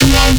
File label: BASS SHOT